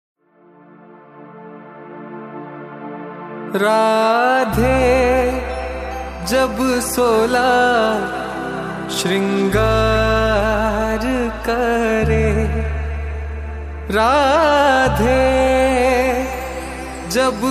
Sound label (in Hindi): Bhajan Prabhu Bin Hi Bulaye Aate Ringtone